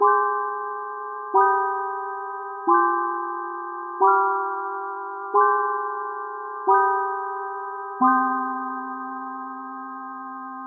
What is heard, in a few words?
Digital